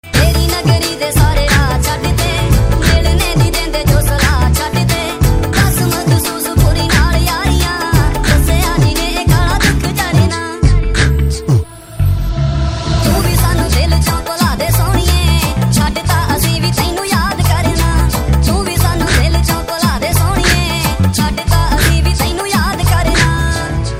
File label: Nice Mix